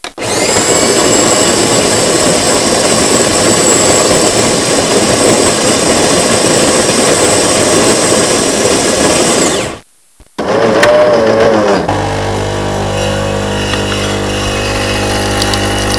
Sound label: coffee maker